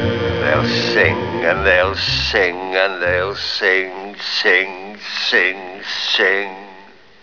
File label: grinch sing